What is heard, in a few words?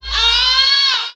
female orgasm